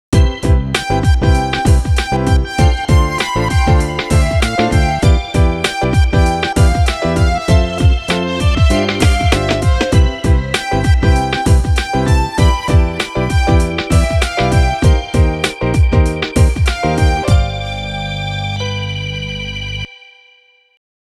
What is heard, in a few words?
Gentle Ending